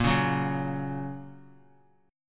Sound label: A sharp Minor Sharp 5th, down; strummed; JGuitar